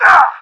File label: pain; action-quake2